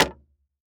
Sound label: Foley Footstep Cowboy Boots Jump On Wood Boardwalk Single; Foley Footsteps